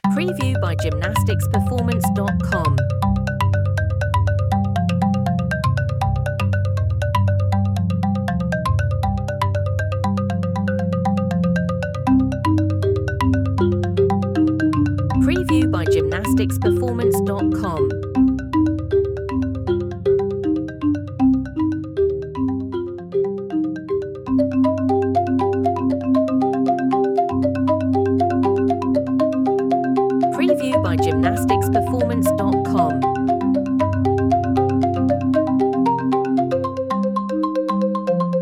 african tribal high energy

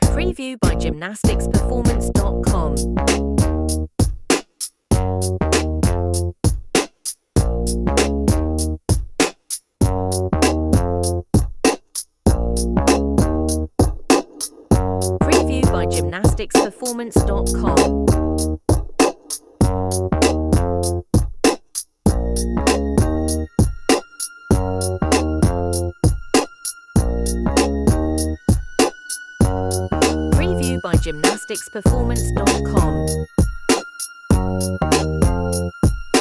2000s hip hop

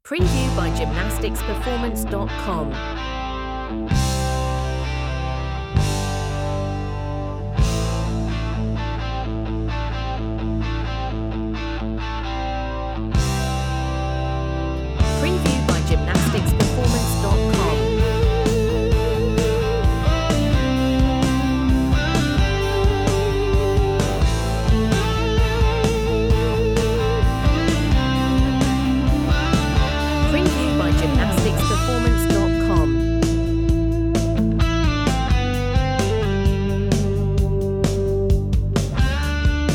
classic rock

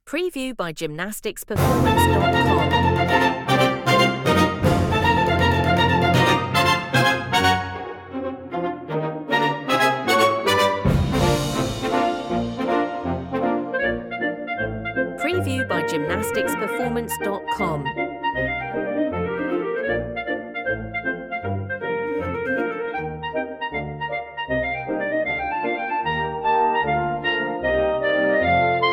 double bass dance

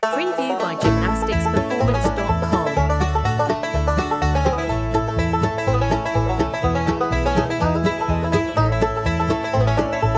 bluegrass

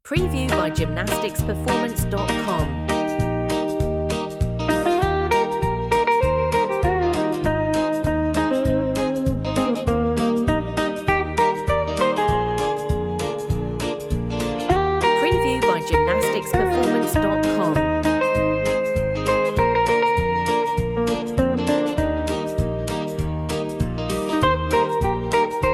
pop country

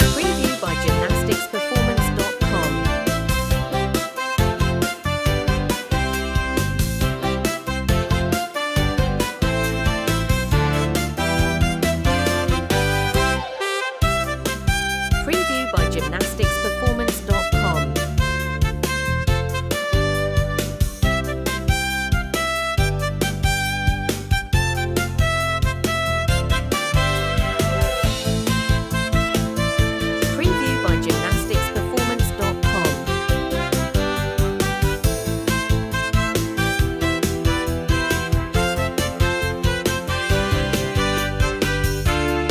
kid pop

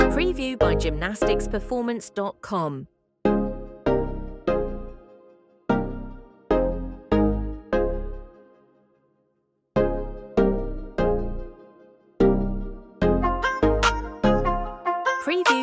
hip hop

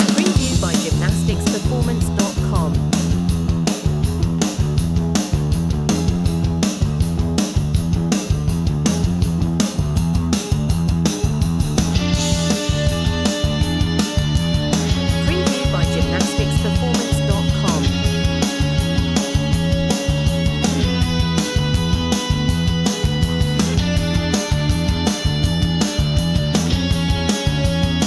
90s grunge rock

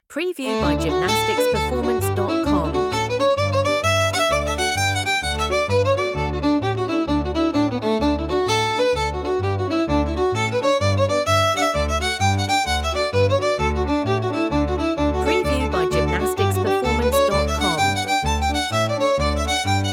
folk

